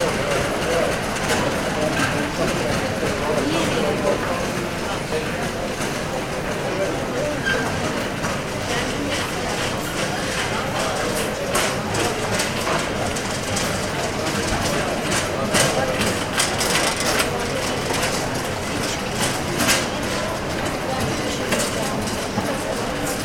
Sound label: ambience airport heathrow arrivals hall; sound effects one